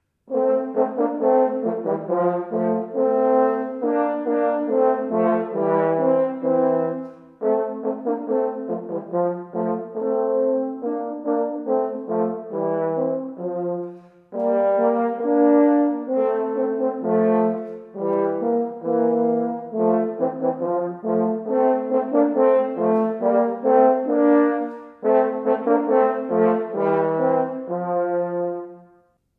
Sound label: Hornduo